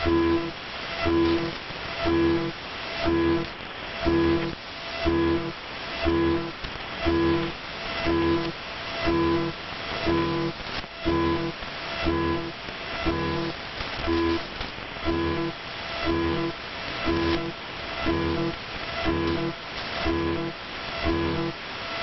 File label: stereo honk time